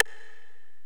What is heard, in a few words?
Korg x5, Drums